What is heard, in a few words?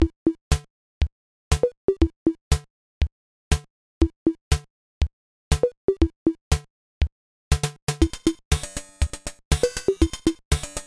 house groove psg opll; weeklybeats 2024